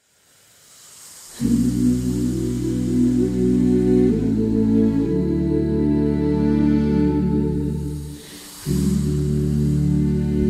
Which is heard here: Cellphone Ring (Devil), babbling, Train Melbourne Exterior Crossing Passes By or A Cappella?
A Cappella